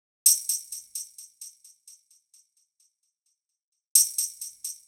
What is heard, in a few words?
Tambourine